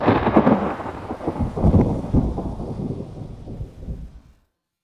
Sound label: thunder; orbit